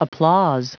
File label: applause